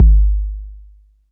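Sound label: bass drum 808 Sat Lo Decay C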